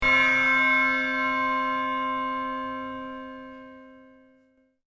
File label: Church Bell C